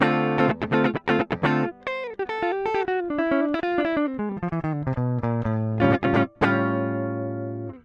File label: Zoom Bypass